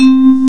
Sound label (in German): glocken; Timidity